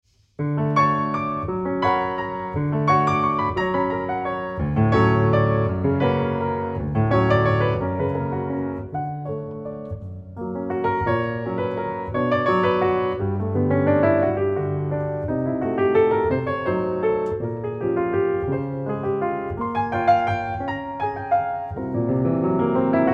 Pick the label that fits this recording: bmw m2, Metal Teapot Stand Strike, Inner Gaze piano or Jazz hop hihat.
Inner Gaze piano